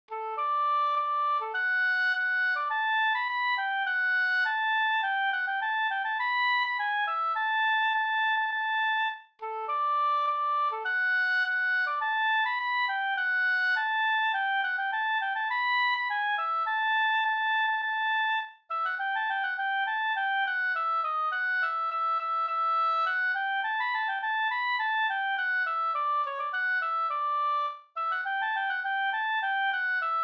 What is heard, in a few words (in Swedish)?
ganglat D dur